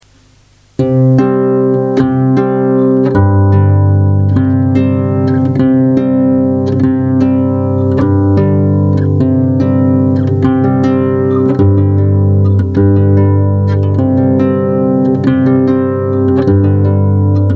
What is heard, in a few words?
mazurka